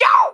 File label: vocal one shot chant